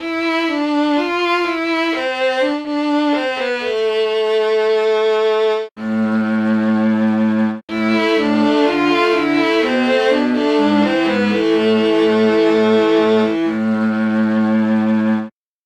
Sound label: fiddle